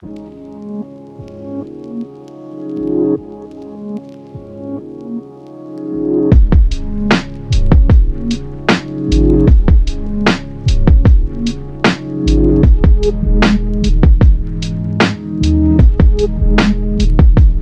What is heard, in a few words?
Lofi